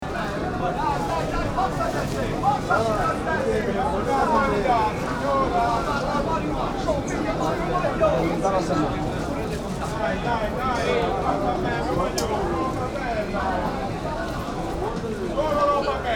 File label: ambience